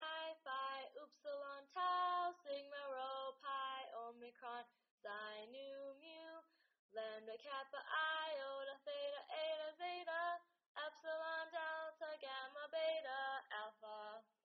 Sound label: Greek Alphabet Backwards song